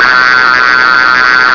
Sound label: growl